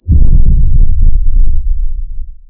cannons sub

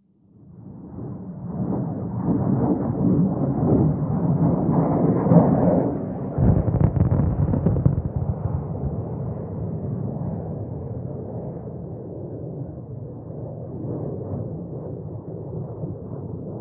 jet flyover int

